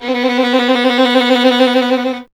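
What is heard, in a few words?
STR violin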